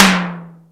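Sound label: electronic tom, electronic drums; Crypton; DrumBase5000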